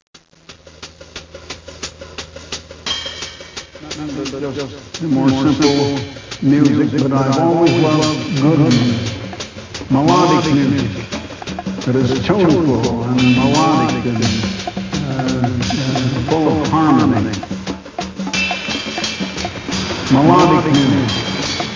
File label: melodic music